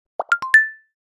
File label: handwashing; apple watchos 11